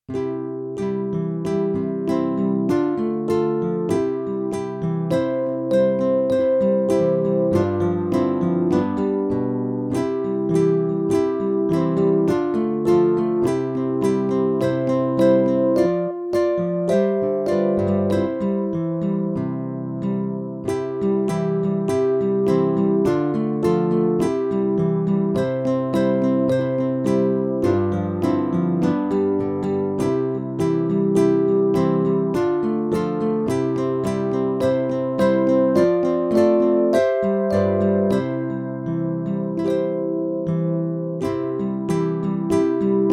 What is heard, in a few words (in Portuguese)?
playback hino